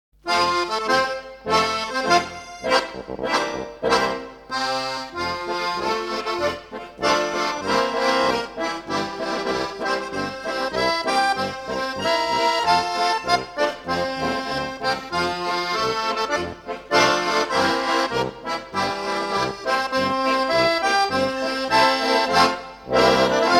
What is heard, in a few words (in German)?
schuhplattler